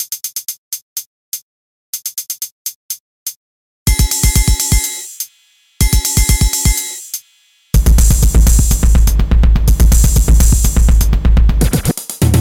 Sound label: Tension Groove